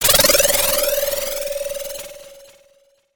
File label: sound design ascend fm; sound effects one